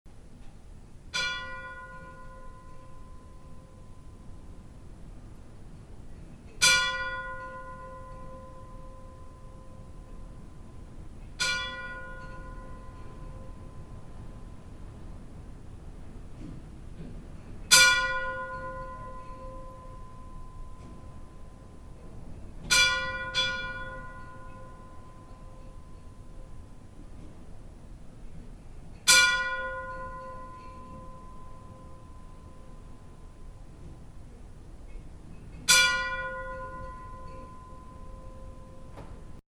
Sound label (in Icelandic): stora asskirkja minni likhringing, kirkjuklukkur